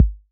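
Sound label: bass drum 808 Decay B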